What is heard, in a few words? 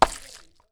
splat